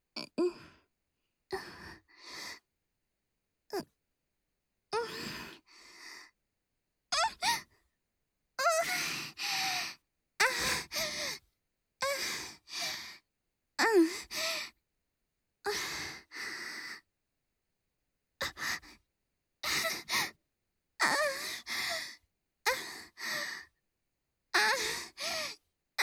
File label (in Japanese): 喘ぎ（SEなし）喘息